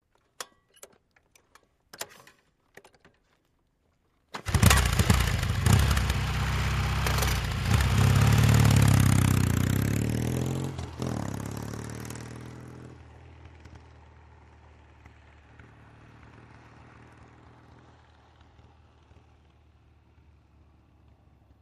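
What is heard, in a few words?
Motorcycle Norton Kick Start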